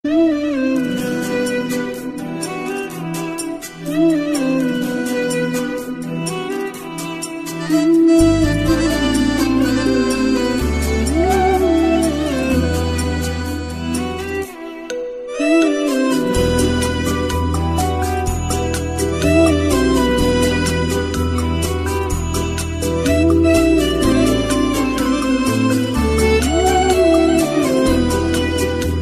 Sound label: Lovely Slow Music